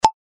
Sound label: token move